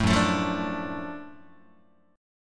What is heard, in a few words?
F sharp Minor Major 7th Sharp 5th F sharp down Guitar; strummed